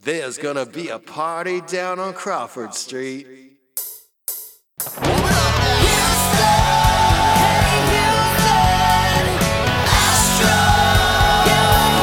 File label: electric karaoke